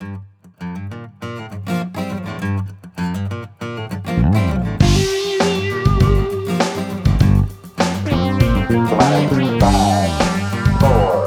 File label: Tube Test Entire Mix; reddi tube di